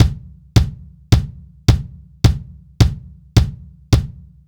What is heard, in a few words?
Straight Kit, World Pop Beats; Straight Kits; Sonic Foundry (Sony Creative Software)